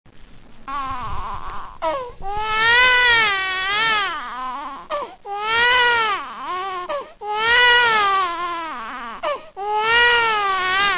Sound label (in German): wah; 2. Monat